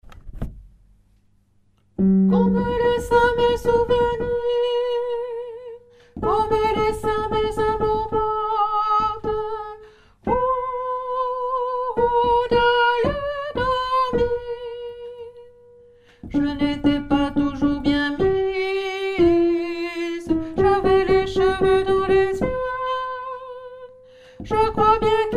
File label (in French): ballade en novembre soprano, choeur